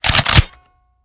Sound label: reload empty end chamber; gmod